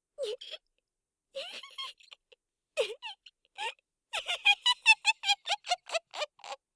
狂い笑い; 効果音あり

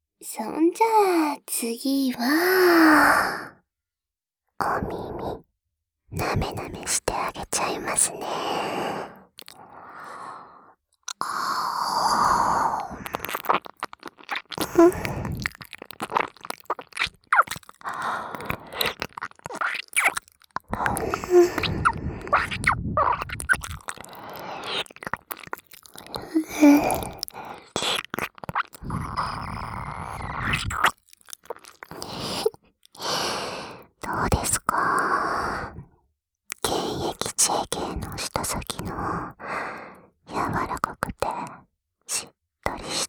耳舐め, ASMR

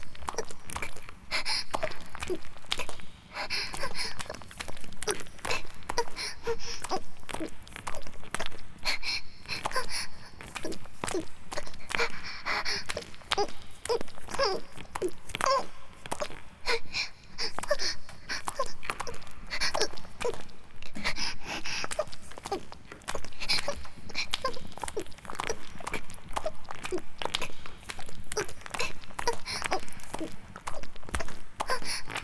雨降りバージョン ギシアンループ; 田舎の別の無感情少女と自己犠牲愛2; おまけ (第3、4話のギシアンループバージョン)

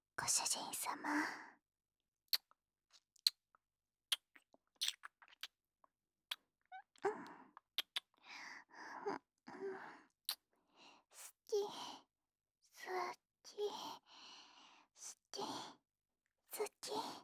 スキ×キス

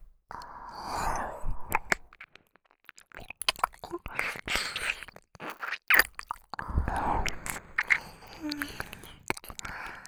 耳舐め; 精子ちょうだい♪

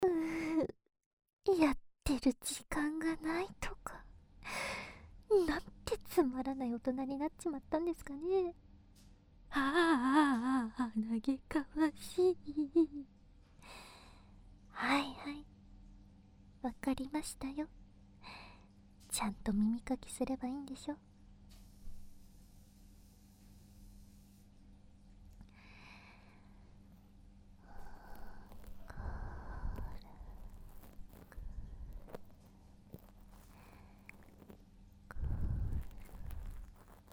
洗濯機と耳かきのASMR, コインランドリー

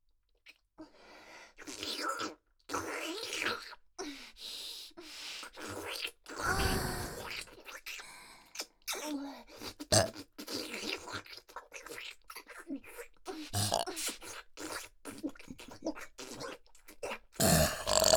ちゅぱ音ゲップ総集編; ドスケベクールなにわかコスプレイヤーのフェラチオ＆媚び媚びゲップお下品エトセトラ

